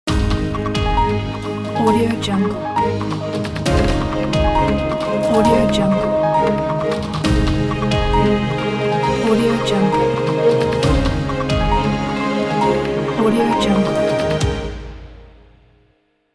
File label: romantic morning logo